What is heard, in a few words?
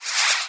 Catherine Wheel Loop Short